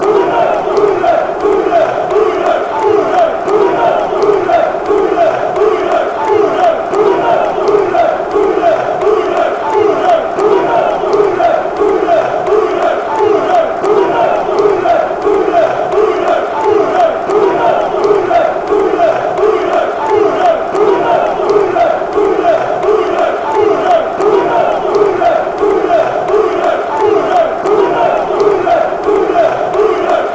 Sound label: chant, fanchants; club brugge